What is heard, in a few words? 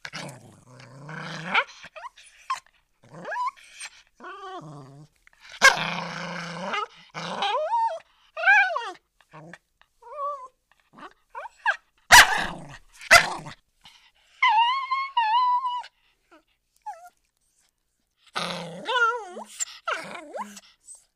Dog Maltese Growl Ang